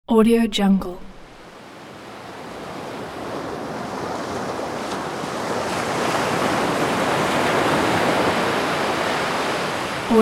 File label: ocean waves coming in; sound ideas